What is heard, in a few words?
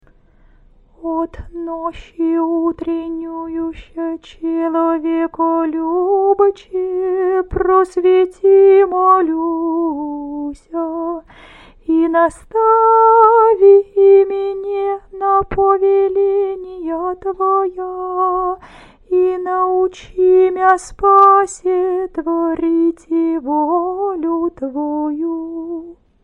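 canon hirmos, singing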